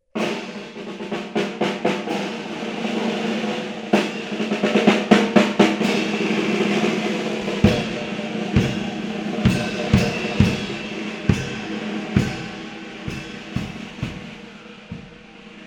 Circular Drums, Olofonia

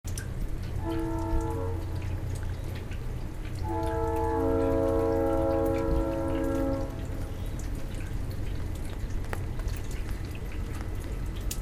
Rain Train Plane, Olofonia

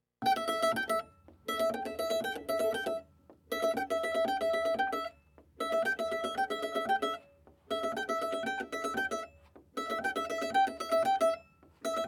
Pick, Olofonia

